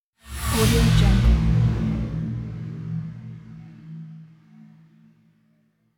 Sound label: heavy deep air stinger; hollywoodedge